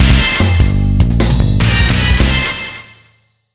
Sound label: BGM Mini Result; Naruto: Clash of Ninja Revolution; Wii